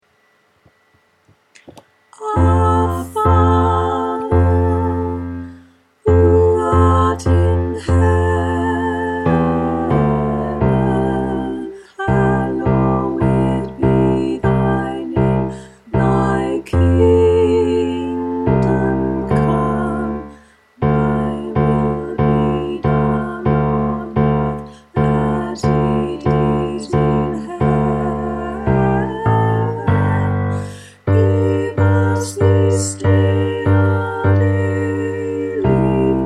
bass

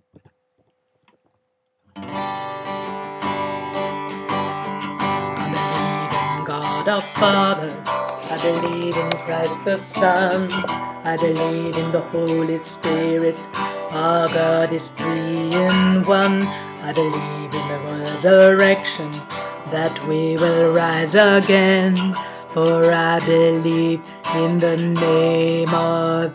tenor

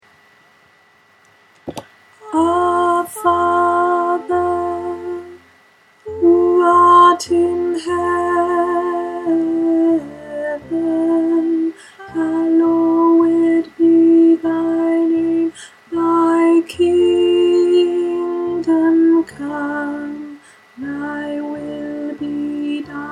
the lords prayer alto

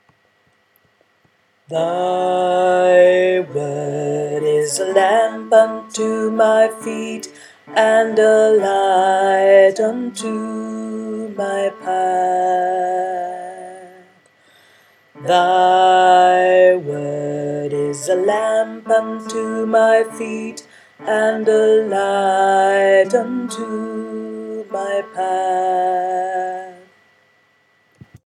tenor

